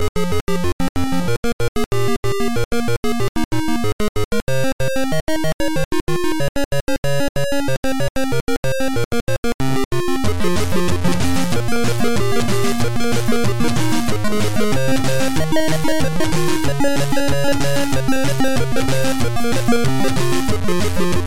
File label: Pop Music